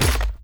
Damage Armour; gamerip; False Knight

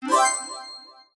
Basketball; Sfx Basketball

